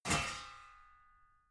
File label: metal sheet crash light